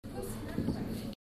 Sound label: loop